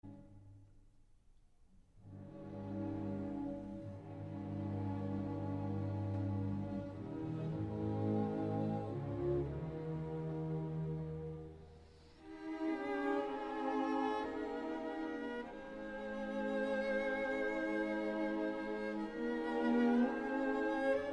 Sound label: Triple Concerto For Violin, Cello and Piano in C Major, Largo; Op. 56